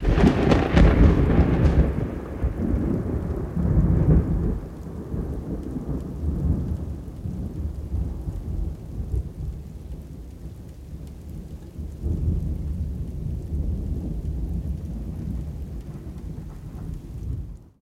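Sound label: Thunder Crack Big Rumble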